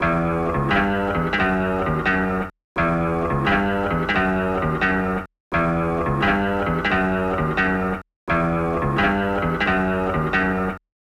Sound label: 174 Em Guitar Line